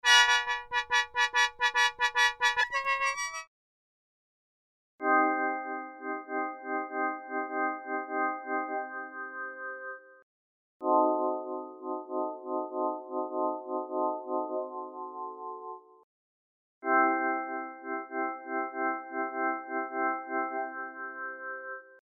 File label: Time and harmonic transposition example